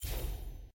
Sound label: lobby page upgrade close